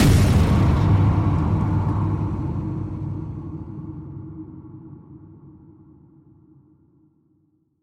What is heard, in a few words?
trailer hit ghostly; soundscrate